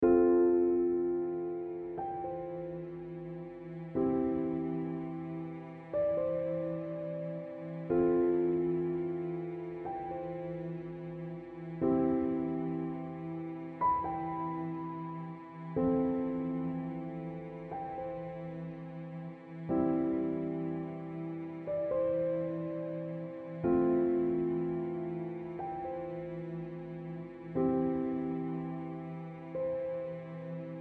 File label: piano strings E2